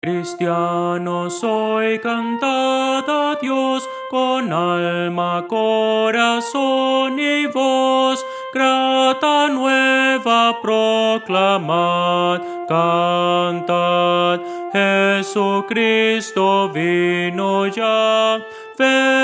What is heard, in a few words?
soprano